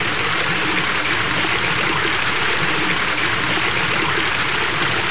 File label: Stream Water; Seer; GamigoNA